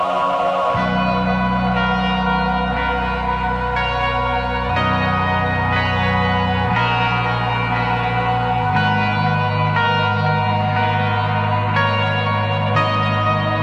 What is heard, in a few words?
Interlude Instrumental